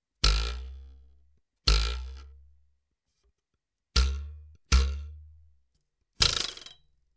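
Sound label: Ruler springing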